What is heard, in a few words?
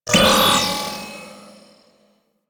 Droid Array Shot, weapons; Warframe; Cephalon